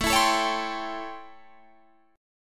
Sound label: A 7th Sharp 9th, Guitar, Open D